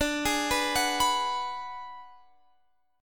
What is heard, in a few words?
B Minor 6th D